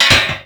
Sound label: Metal Pipe Drop; Warframe; Ambience; NineteenNinetyNine